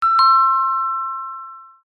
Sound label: household doorbell ring chime